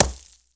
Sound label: hit wood; weapons